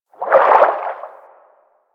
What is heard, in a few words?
Fish Tank Movement Quick; Warframe; Liset; Hub; Personal Quarters